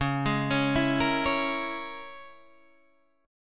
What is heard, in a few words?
Db Minor 6th, chord; Dbm6 arpeggiated; 9,11,11,9,11,9; JGuitar